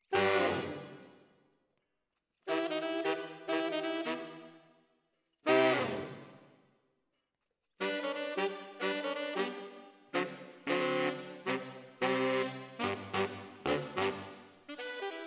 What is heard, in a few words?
ORTF SCHOEPS, piano